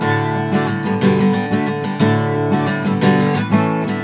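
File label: guitar, 120, Celtic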